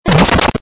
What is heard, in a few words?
touch Wall; super mario